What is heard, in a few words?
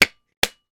food mayonnaise bottle cap close; sound effects one